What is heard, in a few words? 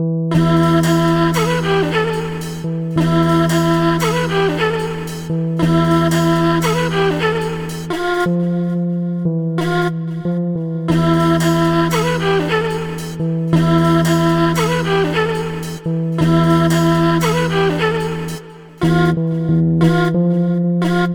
Melody, Hip-Hop